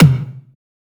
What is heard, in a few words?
tom classic, Drum One Shots; Slate; SD MIDNIGHT Sample Pack